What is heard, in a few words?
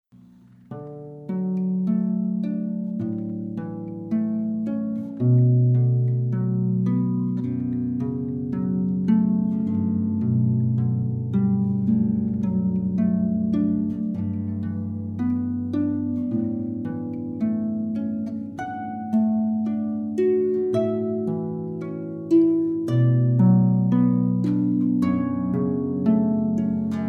canon short; pac canon